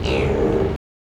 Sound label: Pigs Neg Low